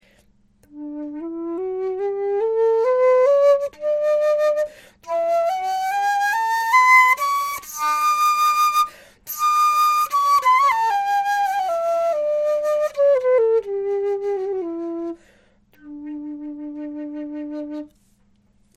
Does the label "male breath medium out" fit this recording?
No